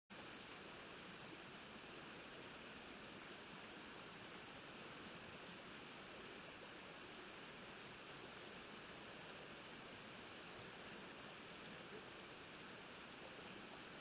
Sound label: riverside